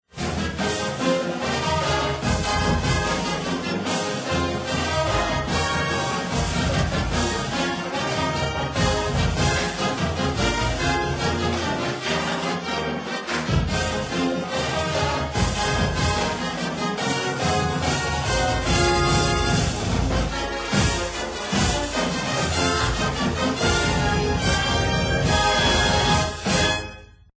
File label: fight song ring tone